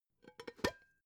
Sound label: pot lid open; objekte; topf